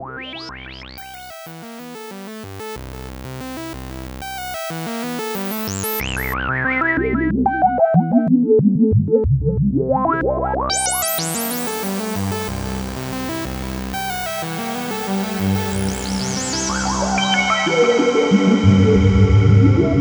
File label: Red Panda Context V2 Malekko Grain